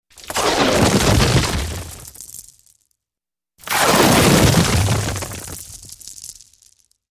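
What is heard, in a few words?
Explosive Rock Break; rocks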